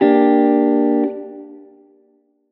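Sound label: guitar chord E flat major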